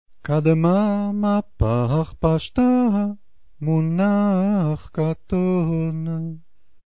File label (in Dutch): Kadma mapach pasjta moenach katon, Tora en haftara, Sjabbat trope; Toralezing Sjabbat